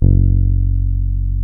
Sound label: BASS F#0, Electric Basses; PlatinumPhattCD2; E-MU Producer Series Vol. 8 – Platinum Phatt